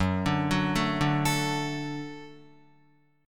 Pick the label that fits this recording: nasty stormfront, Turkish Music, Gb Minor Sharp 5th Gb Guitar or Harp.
Gb Minor Sharp 5th Gb Guitar